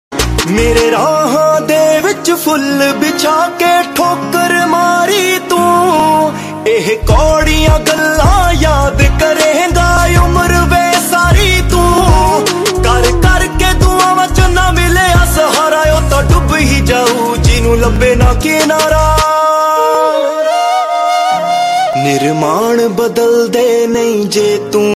sad ringtone

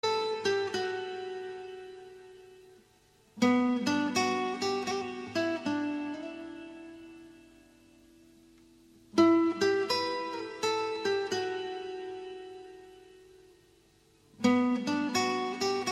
srilankan sad ringtone

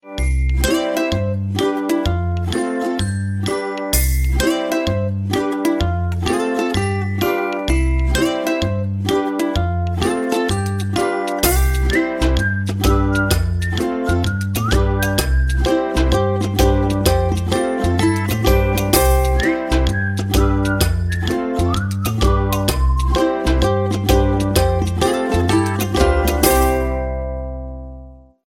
ukulele and whistle ringtone

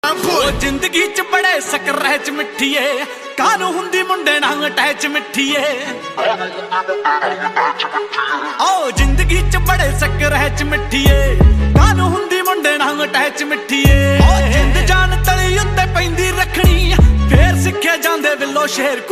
punjabi song ringtone

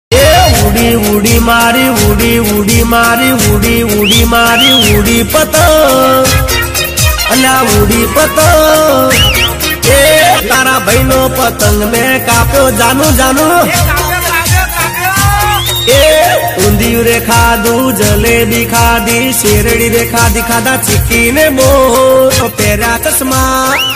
gujrati ringtone

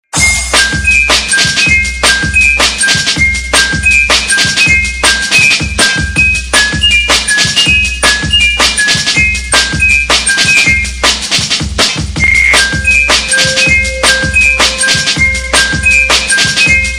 drum bass ringtone

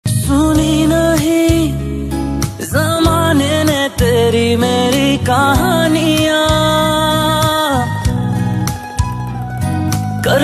ringtone

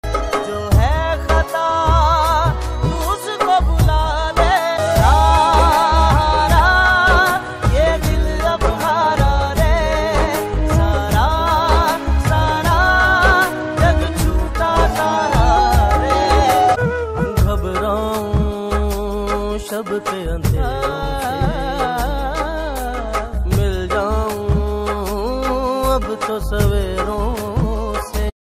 bollywood ringtone

